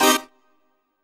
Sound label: HOUSE, House Dance